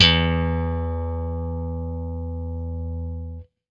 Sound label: BLUE POP, Guitar & Bass; Quantum Leap; 90's Sample CDs